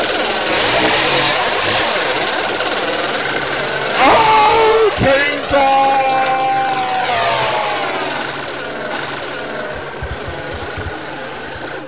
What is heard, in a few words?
CHAINSAW